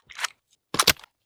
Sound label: smg1 reload, weapons; hl2dm